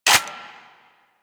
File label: Heavy Shotgun Cock Start; Weapons; Warframe; Tenno